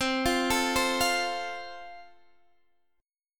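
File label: A Minor Sharp 5th, chord strum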